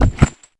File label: War Mammoth Defend Sound; Heroes3